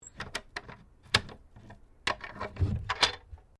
Door Pivot Wooden Bookcase Open Key Lock; Doors; Various doors; Miscellaneous Doors; SoundEffects Foundation2

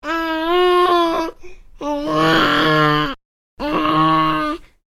Baby: making vocal noises, slight growl; Voice; Baby Sound Effects; Babies (Sound Effects:Voice); Stuart Duffield

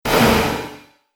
Synthetic Crash Sound, impact; Miscellaneous Impact Sound Effects

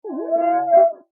Foley Glass Squeak Clean Window Single; Squeaks; Objects

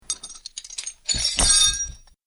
Metal Chain Sounds; Metal Sound Effects; Chains Sound Effect - Clink, Clatter, Clang, And Clink Are The Sounds That These Chains Make.

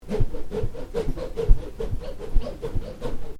Swish: Twirl Loop, Light, Whoosh; Whooshes (Sound Effects:Sound Design); Swish small twirl

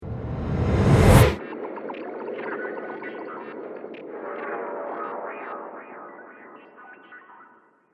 Trailer Hit Sf Data Stream; Sonic Logo Sound Effects

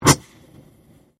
Convolution Surge

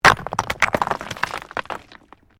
STONES MOVE; Material components for constructing more complex sounds

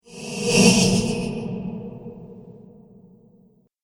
Possessed Ghost Spirit; Ghost Sound Effects; Sinister, Scary, Spooky Or Just Silly

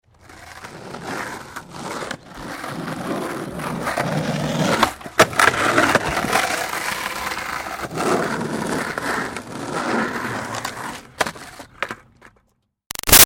Skateboard Olly Rolling Moves; Pass Bys; Sports; Skateboarding; Big Room Sound

